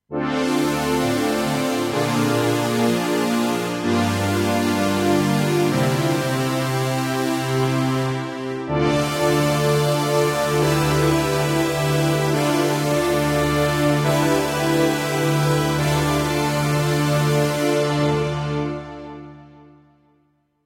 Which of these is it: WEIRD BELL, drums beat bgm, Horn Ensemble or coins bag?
Horn Ensemble